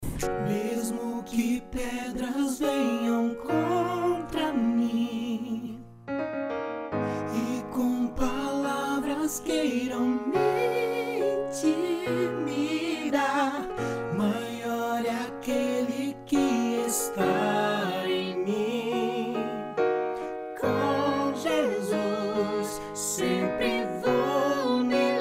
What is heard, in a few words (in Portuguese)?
tenor e soprano